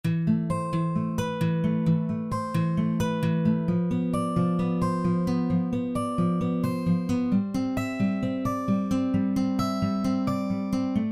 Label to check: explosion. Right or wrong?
Wrong